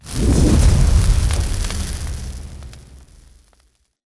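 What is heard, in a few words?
dish electrical explosion; Titanfall 2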